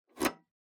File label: PC Hardware USB Unplug